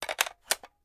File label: unload; Benny Placeholders; weapons